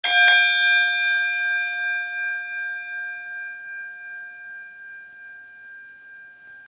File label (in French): cloche prius